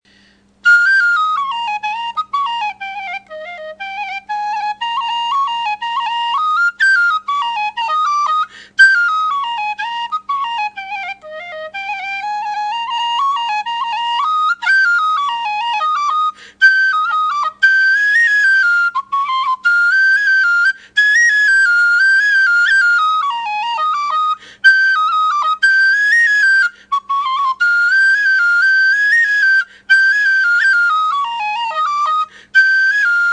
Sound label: water weasel eb